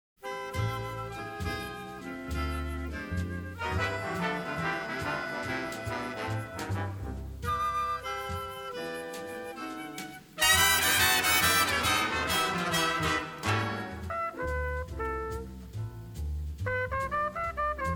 piano take

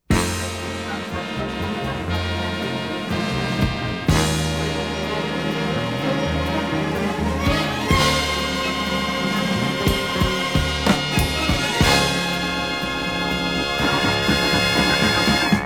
Fanfare

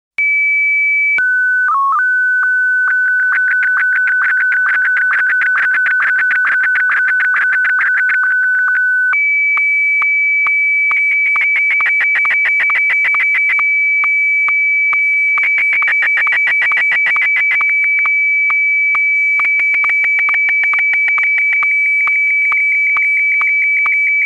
High Frequency Sounds

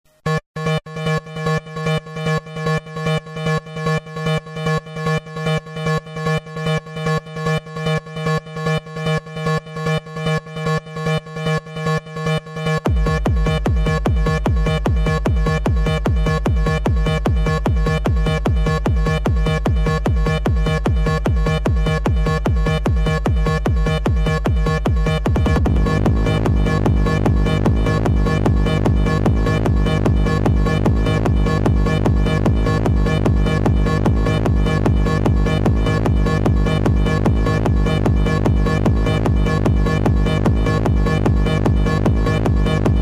Funkk

